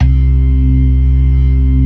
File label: ORGAN LOWER MANUAL